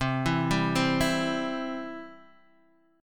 C Major, Guitar, DADGAD

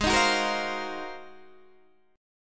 Ab 13th down Guitar DADGAD; Ab13 strummed

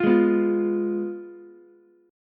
F Diminished Ukulele; Fdim strummed; JGuitar

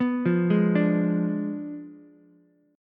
B Diminished 7th B down Ukulele; arpeggiated; JGuitar

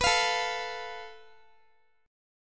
A Suspended 2nd Sharp 5th, Guitar; Asus2#5; strummed; x,x,21,22,21,x

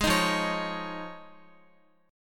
F Minor 7th Sharp 5th, Guitar; strummed

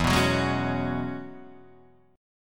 E 6th Add 9th E down Guitar Open D

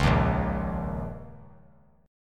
A sharp Minor Double Flat 5th, A sharp, down; A#mbb5 strummed; JGuitar

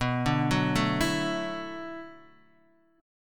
B Minor 11th B down Guitar Open D

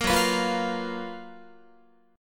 F sharp Major 11th Guitar; F#M11 Chord; strummed

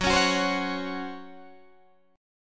Db Major 7th Suspended 4th Gb

